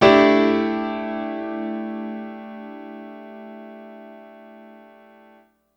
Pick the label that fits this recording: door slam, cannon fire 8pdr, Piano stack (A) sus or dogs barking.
Piano stack (A) sus